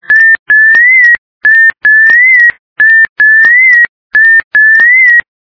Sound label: whippoorwill